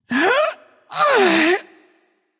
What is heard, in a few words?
grunt lift light; smite; voicepack; hel